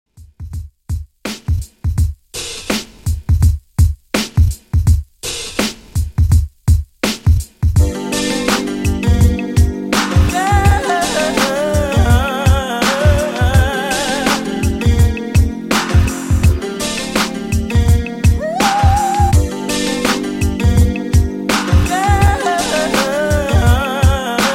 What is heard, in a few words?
Old School 83Bpm